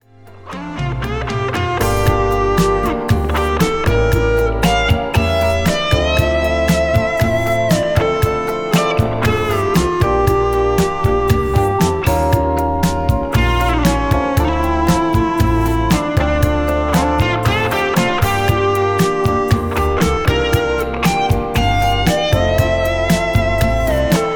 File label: guitar